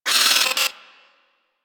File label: Hyena Micro Vocal Pain; Warframe